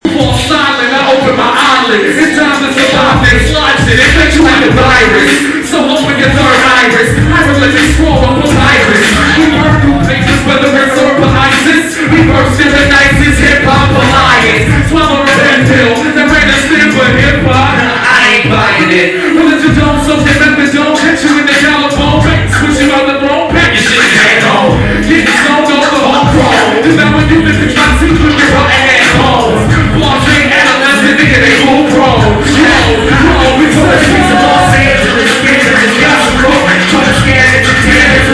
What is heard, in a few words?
live hip hop; long beach